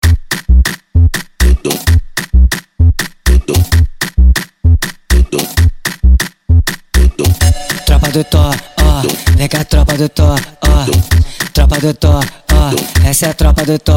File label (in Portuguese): funk consciente e funk ritmado